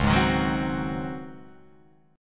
Db Major 7th Suspended 2nd Suspended 4th, Db, Guitar, Open F; DbM7sus2sus4 strummed; JGuitar